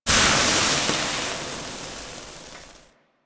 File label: Cooking Sizzle; Ambience; Props; Warframe; The New War; Zariman; Quests